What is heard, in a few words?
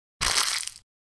hash brown bag crushed; mcdonalds